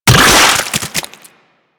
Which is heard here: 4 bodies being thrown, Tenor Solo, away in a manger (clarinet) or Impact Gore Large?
Impact Gore Large